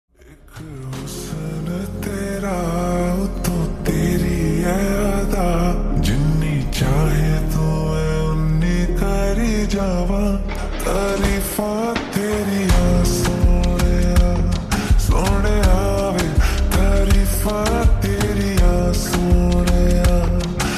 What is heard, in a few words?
Slowed Reverb ringtone